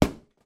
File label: person punch or kick cardboard box; foley